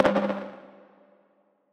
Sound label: K3000 Snare